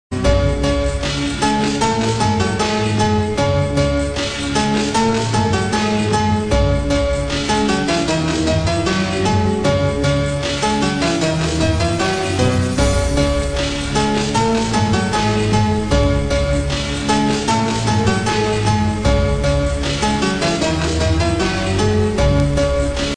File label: piano